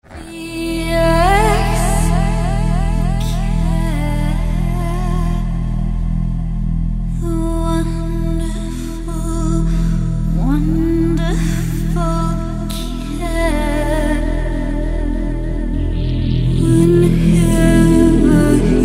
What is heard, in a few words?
distort